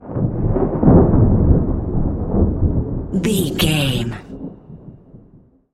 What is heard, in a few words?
thunder lightning strike rumble